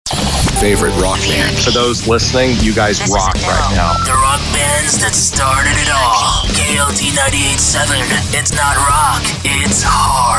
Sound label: ROCK Radio